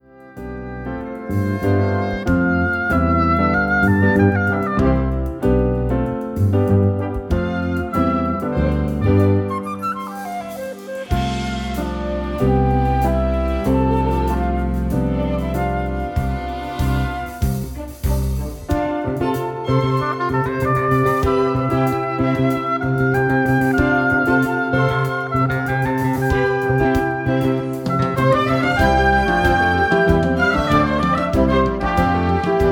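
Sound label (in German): Klänge Instrumental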